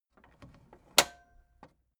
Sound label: tape deck close soft